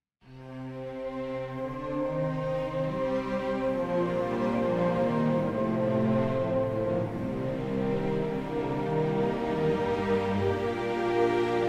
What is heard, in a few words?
Cinematic Orchestration